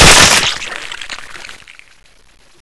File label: baby burst